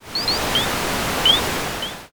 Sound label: forest